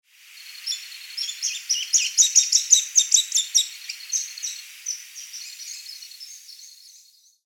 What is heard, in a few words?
Accipiter superciliosus chatter; Santa Rosa